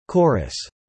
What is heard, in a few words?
chorus